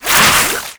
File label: poison projectile passby